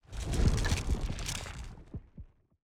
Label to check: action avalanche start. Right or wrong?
Right